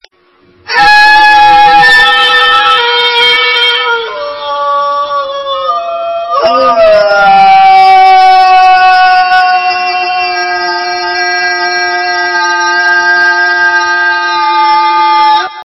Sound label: Scream tone